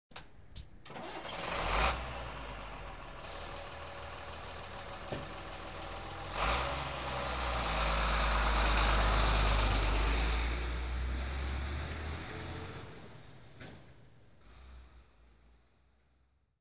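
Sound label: diesel exterior start up, drive off; Transport; BBC Sound Effects Library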